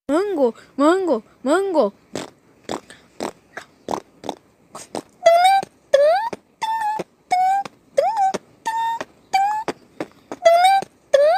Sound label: phonk kid voice